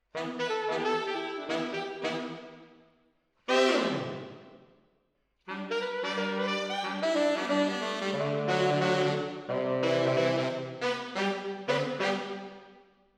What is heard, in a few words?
MKH AB, piano